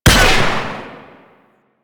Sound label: Pistol Shot Primary; Sentient; Crp Sent Amalg Pistol